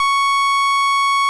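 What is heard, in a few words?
OB8 organ F#4, OBERHEIM OB8; Big Fish Audio - First Call Vintage Keyboards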